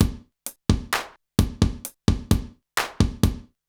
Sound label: Alesis HR-16B (130BPM), beats